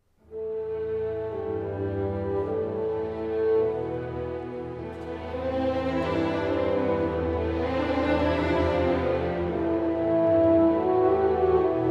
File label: Cello Concerto